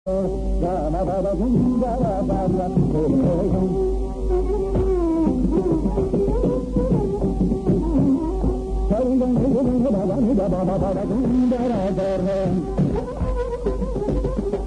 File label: panthuvarali swarakalpana; manodharma; sundaratara